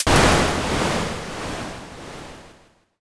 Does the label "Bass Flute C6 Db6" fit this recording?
No